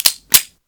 load, gun shots; 1000fx

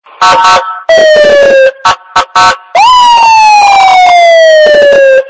Car Sounds Ringtone

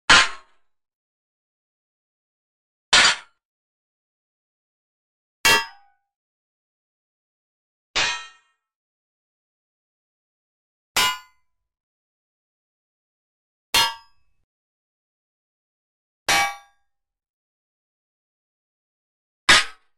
Armor Hits; Hollywood Edge Martial Arts and Human Impacts

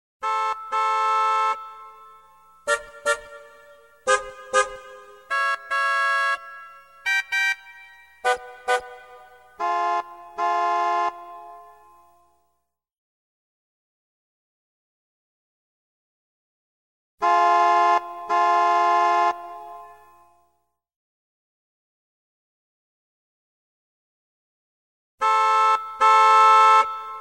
car horns; SOUND EFFECTS1

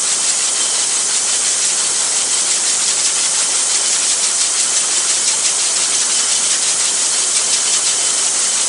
BOTTLE CAP; 1000fx

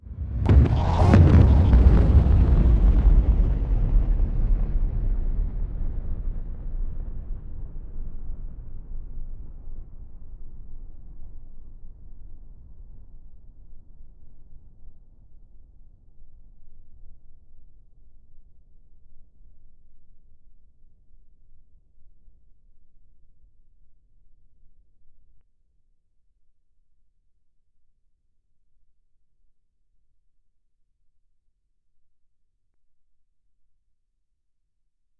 impact

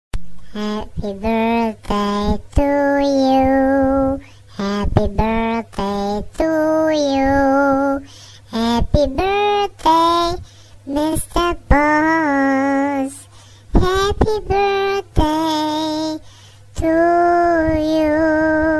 Funny Baby Ringtone

